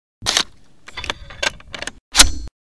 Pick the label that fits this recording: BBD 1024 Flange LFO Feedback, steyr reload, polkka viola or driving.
steyr reload